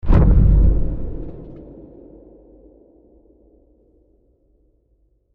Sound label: cannons single shot